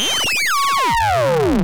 ARP2600, modular syn

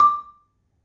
Marimba, D4; Terkelsen

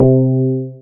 BAS FM DX-7

